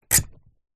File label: household door hatch wooden plastic latch close squeak; zapsplat